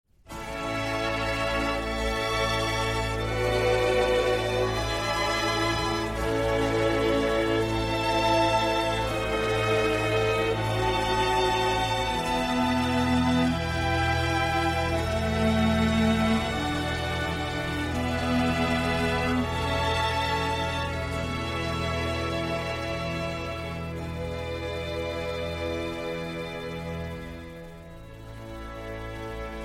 Concerti Armonici in A Major, Grave assai sostenuto